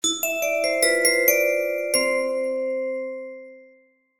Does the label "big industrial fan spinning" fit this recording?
No